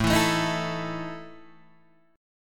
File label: E Major 7th Suspended 4th, A